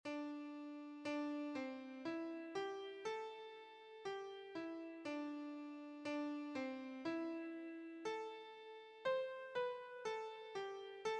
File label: wondrous, keyboard